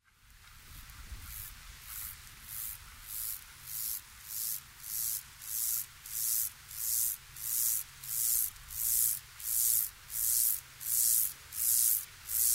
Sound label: mottled grasshopper